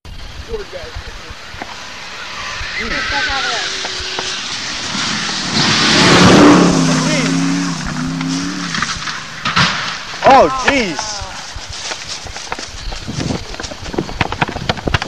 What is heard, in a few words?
crash stage, Rally; Stages; Rally sounds; Hairy Canay